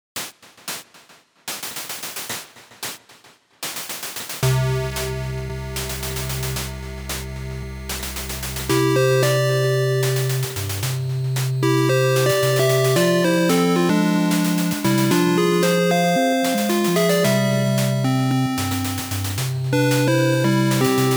Ending (8-bit); sengoku efuda yuugi hototogisu ran original soundtrack 2008

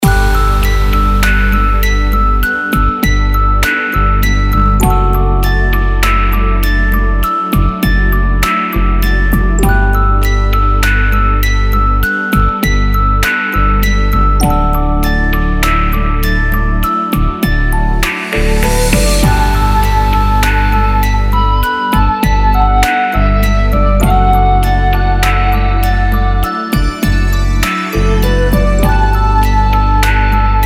map bgm; Lanota; Android

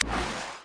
Space Maximize

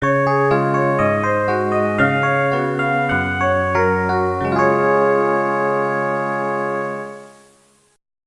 In-car broadcast chime, Joetsu Shinkansen; Densha de Go Shinkansen; Sany Shinkansen Hen; PS2; Wii

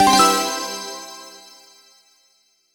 medal; Namco Museum Arcade Pac

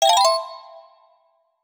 Collect Banana Bunch Coin; Donkey Kong 64

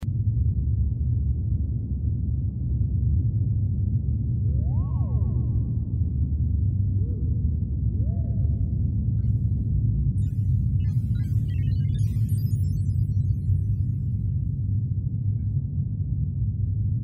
Space Environment; U.B. Funkeys

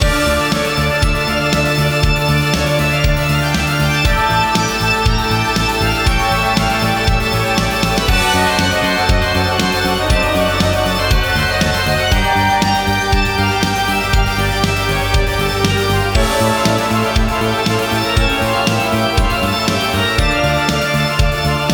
Castle Inside Loop; Jitsu Squad; ps4 ps5 switch windows xbox one xbox series xs; Castlehellstorm

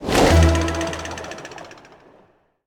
Monster Attack; Lagoon

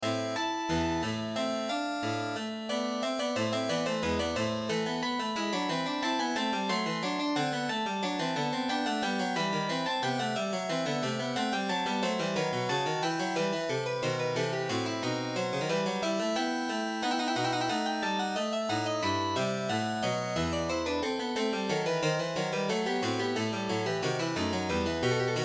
Classical

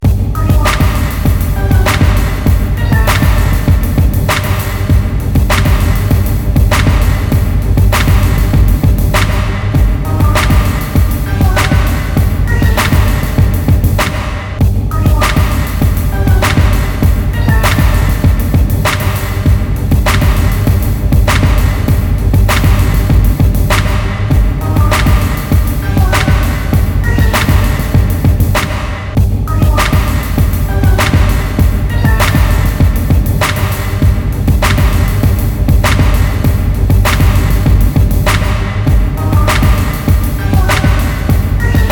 Beat; NBA Live 2005; GC

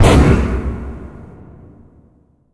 Music; unreal development kit

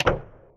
Error

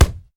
Physics Body Impact Self

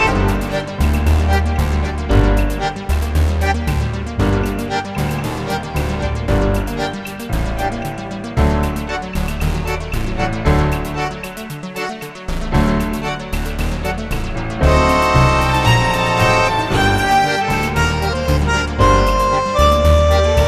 Tango Sequence; mass effect 3 citadel gamerip 2013; Silversun Strip

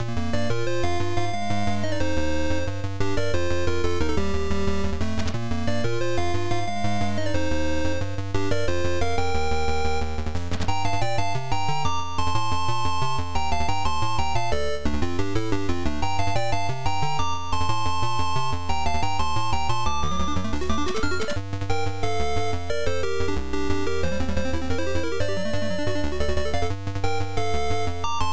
Ruins (Sad); Pegu; treasure of the usas; msx

